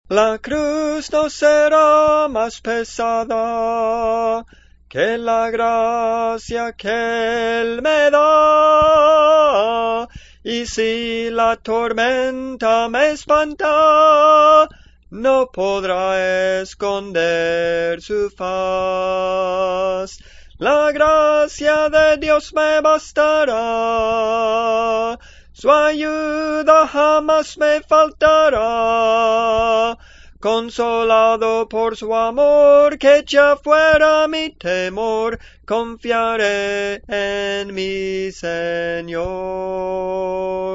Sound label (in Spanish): Tenor, Cantos Espirituales